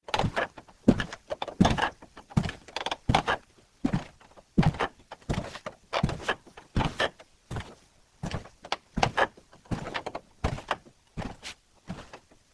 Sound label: rug